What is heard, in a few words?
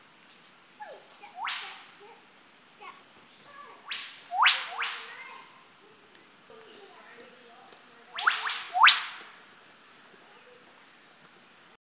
Whip Birds